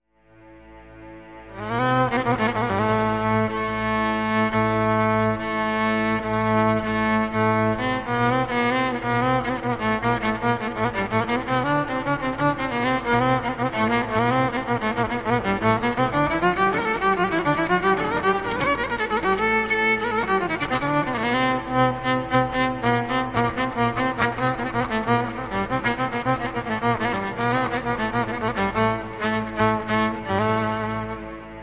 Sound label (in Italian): solo violi